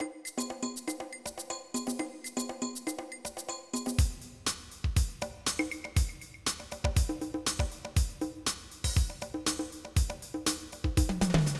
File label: drums; Monster Media Number 15